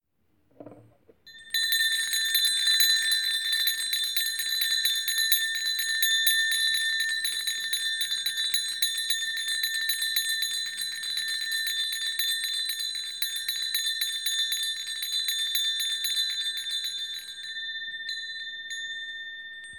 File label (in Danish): klokke